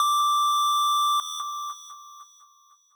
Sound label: lead6